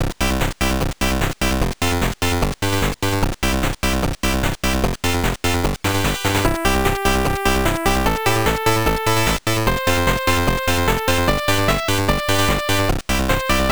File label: Drawing Song; bonk s revenge turbografx 16